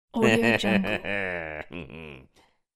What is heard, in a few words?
psycho male laugh; human sounds